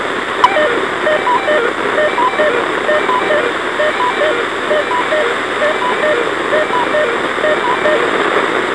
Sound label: EMS Toneout